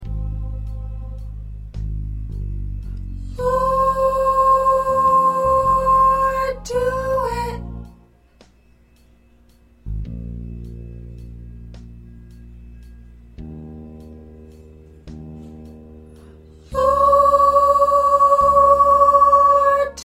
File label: Soprano